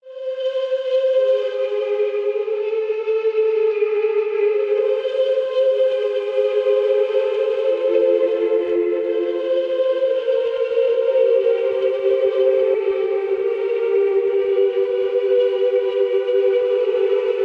Ghostly Tones - Dmin 110 bpm, Atmospheric; MIDI Clips; Tonal; Ableton; Factory Packs; Mood Reel

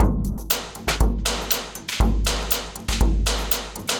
Foley & Real Drum Kit; drum racks; Ableton; Factory Packs; Mood Reel

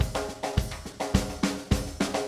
105 March Drums; Pop Rock

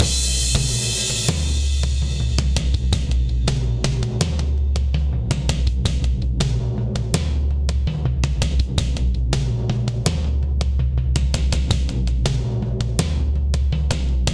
Toms Ambient Loud Punch 82bpm, Session Drums; Tomzlla; Ableton; Factory Packs; Session Drums Studio

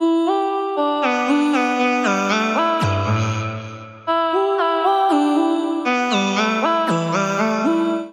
Digital Voice Machine G Lydian 118 bpm; MIDI Clips; Chromatic; Ableton; Factory Packs; Voice Box; Transformed

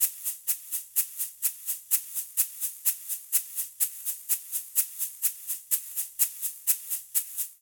Funk Shaker 126bpm; Latin Percussion; Ableton; Factory Packs

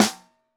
SN1 Bottom, Snare; Ableton Foundation FreePacks; Snare Designer

